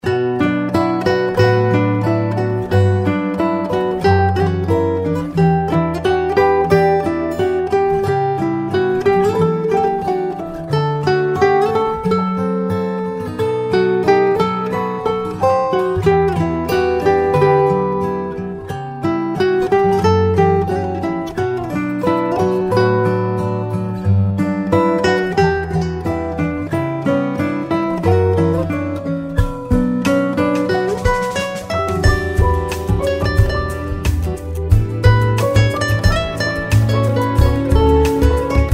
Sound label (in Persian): Ringtone Bikalam